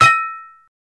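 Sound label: Boxing Bell Hit Muffled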